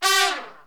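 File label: brass, Tpts FX Falls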